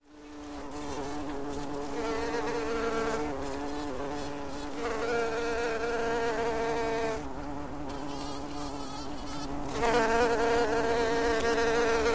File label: black bumble